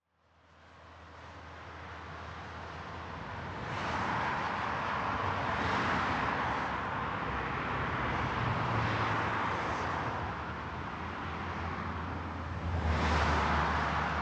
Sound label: bridge above the highway in the forest